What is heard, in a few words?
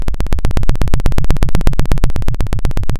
Sound of a helicopter